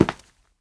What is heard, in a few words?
Step